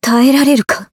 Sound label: Taking Damage; BBCF; Izanami